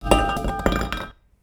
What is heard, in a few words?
metal weight drop; screwdriver